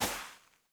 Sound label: Personnel Footsteps Sand; DCS